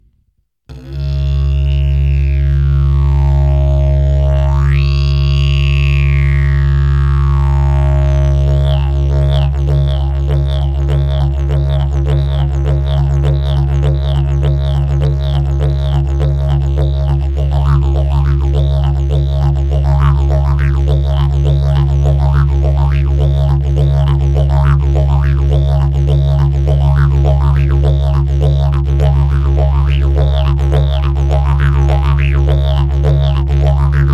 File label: didgeridoos